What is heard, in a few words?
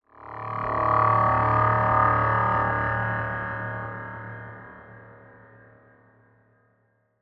Pad; Dubstep